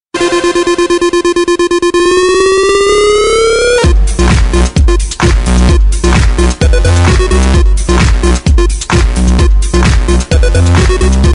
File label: club rocks; ringtone